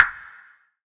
Beatbox Perc, Hybrid Beatbox Drums; Antidote Advent

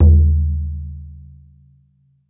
BODHRAN, Celtic Percussion; Drums&Percussion; E-MU Producer Series Vol. 7 – Old World Instruments

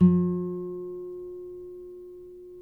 NYL NV G, nylon no vib guitars; Spectrasonics; Hans Zimmer Guitars VOL-1

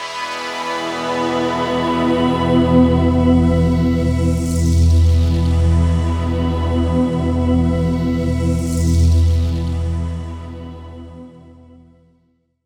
Power Ambi Pad; Jungle Warfare 1&2

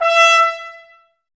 Woodwind Brass Ensemble; Miroslav Vitous

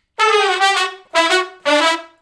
Funk Riff (D) unison, Horncraft for R&B; Sections; Crimson Blue and Fabulous Horncraft 4 RnB

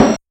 Rhythm Snare + Grinder, Realistic Concertmate-670; Layered Kit

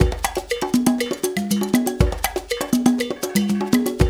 loop, Percussion

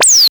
Noise Hit

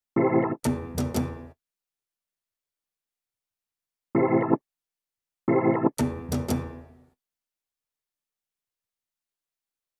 Melody; Sonic Foundry (Sony Creative Software); Westside Underground

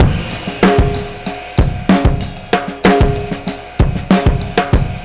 Funky Drums 95; musicradar

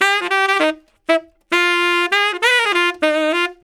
SAX LICK, BRASS LICKS; Ueberschall; Jam Box

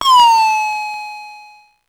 Drum, Electro-Harmonix Spacedrum

